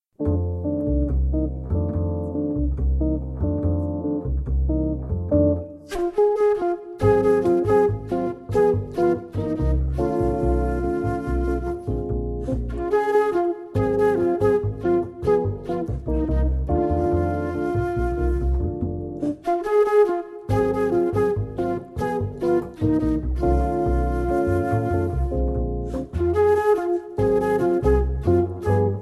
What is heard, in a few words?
Funky Bossa, woodwind